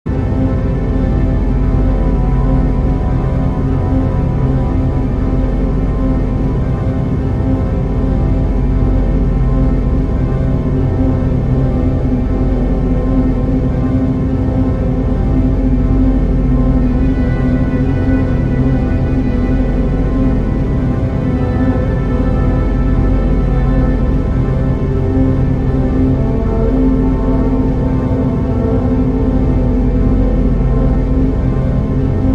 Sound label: pop ambient